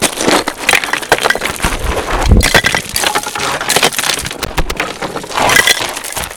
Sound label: Quarry Step